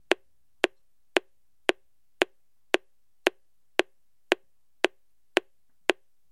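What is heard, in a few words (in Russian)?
электрический метроном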